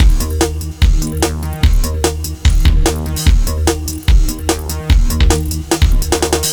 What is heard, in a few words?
Reso Loop; Sonic Foundry (Sony Creative Software); Dr. Fink's Funk Factory; Complete Loops